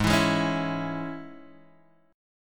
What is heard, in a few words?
G sharp Suspended 4th Sharp 5th G sharp, Guitar Half Step Down